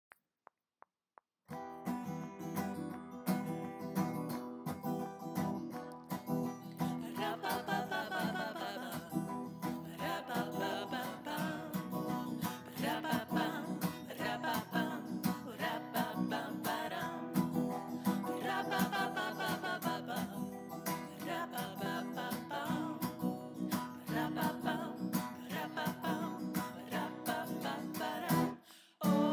sjoebidoebidoebaah diskant tenor